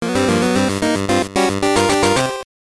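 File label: Jingle, Game Boy Color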